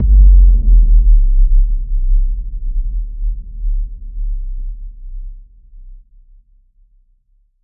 cinematic boom

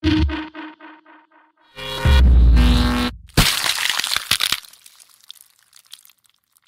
ghost use crunch

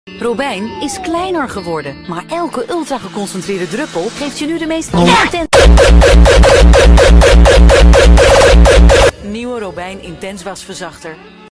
techno

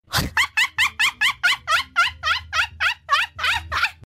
ha ha ha ha ha ha ha; usada pekora

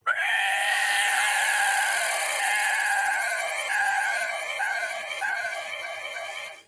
the craziest noise you ever heard

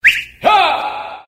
whistle haaa

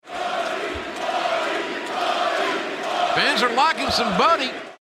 buddy chant

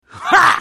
lion laugh

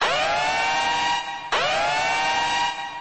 red alert alarm

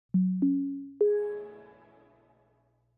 Windows 11 startup sound bass boost

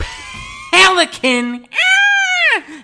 pelican

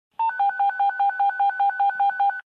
panic button

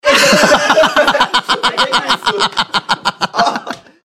hahahah

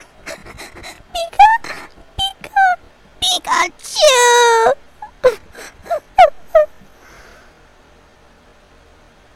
cry; pika

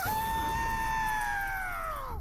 scream; hol horse

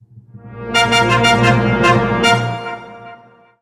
intro trumpet

